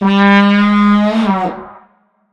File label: Vuvezela